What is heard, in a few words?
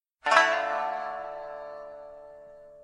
Shamisen